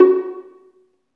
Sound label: STR Violin, Solo Violin FX; Roland L-CD702; Solo Vln FX